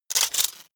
sci-fi electromechanics tiny voltage spark

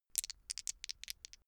dice shake hands open short

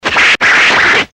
fight kung fu swish seq

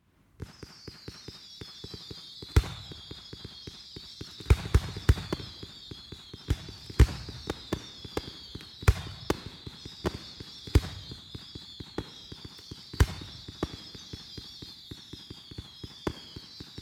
fireworks with skirling

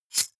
knife slash light

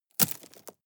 drop stones small

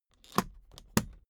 suitcase close latch lock hard

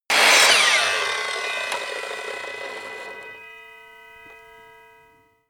table saw on load

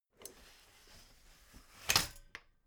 folding chair fold slow

